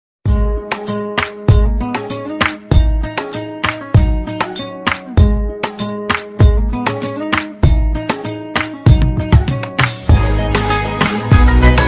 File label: love bgm ringtone south indian bgm ringtones; paiya